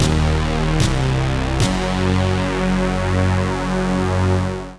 Dun Dun Dun

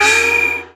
Character Hit

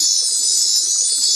Ventil Chime

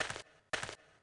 Walk; Conquer Online 2.0

